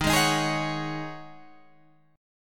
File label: Db Suspended 2nd Suspended 4th Eb